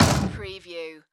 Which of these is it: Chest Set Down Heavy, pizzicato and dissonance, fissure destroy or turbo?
Chest Set Down Heavy